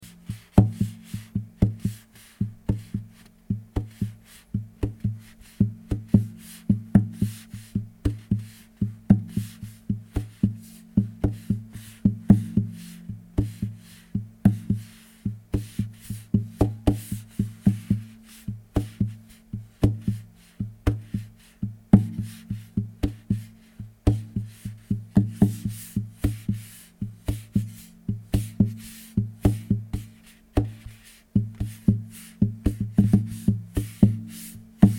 Dm112 Spooky Blues

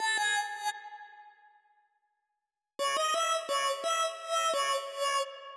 Squinky synth loop

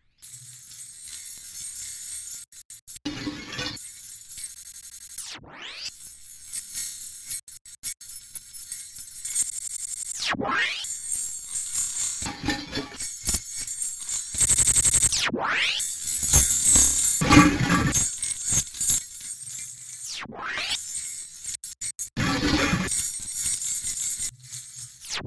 Glitchy Bells with Bass Boost

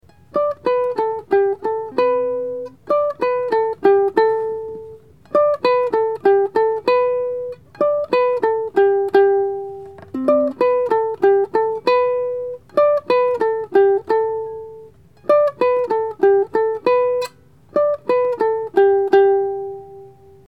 Little Uke Melody

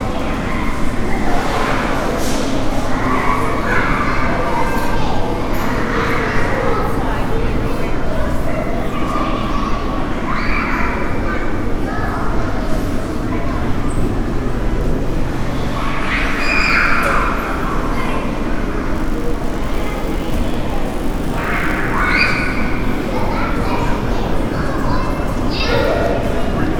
mall playground

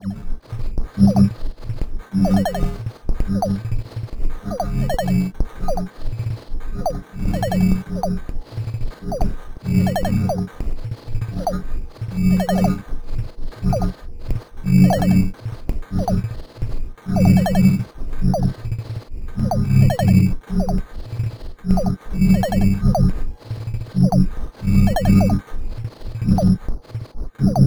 Cm72 Zone Ambience

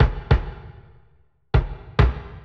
Break; The Stubblefield

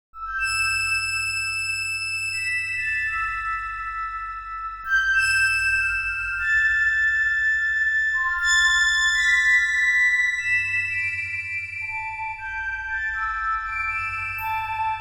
Am 120 synth lead; Cold Circle

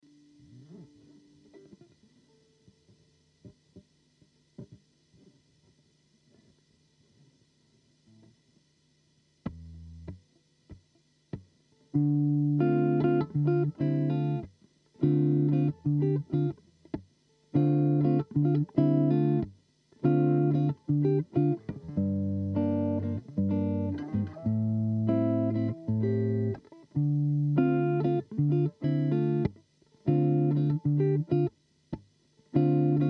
Dm96 Bluesy Groove in Five Four

